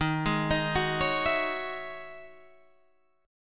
E Major 13th chord

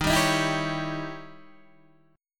Eb 7th Flat 9th Eb, Guitar Open D; 13,10,13,10,10,11

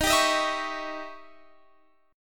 D sharp 7th Flat 9th Guitar Open F

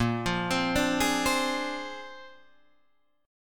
A sharp 9th A sharp, Guitar Open D

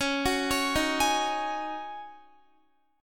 Db Suspended 2nd Suspended 4th Db Guitar; arpeggiated; x,16,16,18,15,15

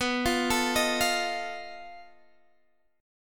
B 7th Flat 5th B Guitar Standard; B7b5 arpeggiated; 19,20,19,20,18,x; JGuitar

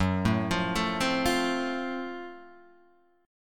Gb Minor Major 7th Flat 5th; arpeggiated; 2,0,3,2,1,1; JGuitar

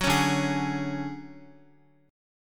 D Major Sharp 11th D Guitar, chord; strummed; JGuitar

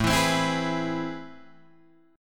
A Minor Major 9th, down, Guitar, Drop D

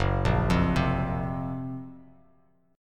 Gb Major Sharp 11th, Bass Standard; arpeggiated; 2,1,3,5; JGuitar

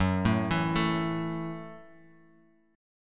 F sharp Minor 7th F sharp, Guitar Half Step Down

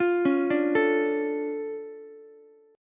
D Minor Major 7th, D, Ukulele; 10,13,10,12; DmM7 arpeggiated; JGuitar

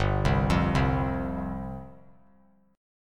G 7th Suspended 4th G down; G7sus4 arpeggiated

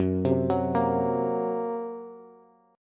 Gb Major Flat 5th, down, Bass; arpeggiated; JGuitar

